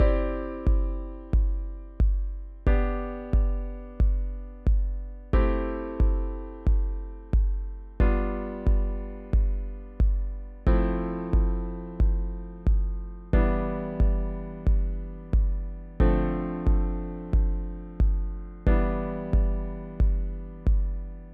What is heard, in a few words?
Cadd9 G/B Am7 G7 FM7 Em7 Dm7 Em7